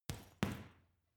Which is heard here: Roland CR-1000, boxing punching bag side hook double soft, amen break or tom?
boxing punching bag side hook double soft